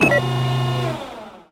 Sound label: Hi-tech Panel Servo Button